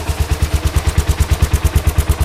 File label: suzuki f50 idle, motorcycles; mono mic